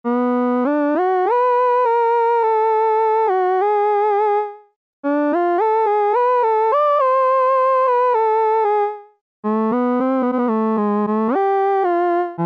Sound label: SH2000 Vox, Roland SRX-07 Ultimate Keys